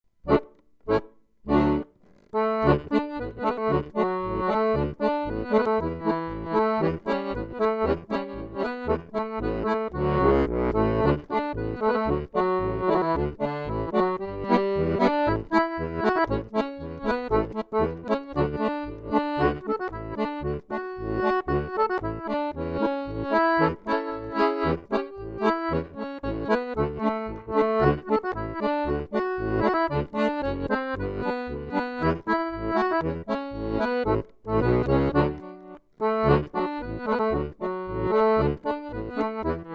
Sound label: Chinese Breakdown, Traditionel Cello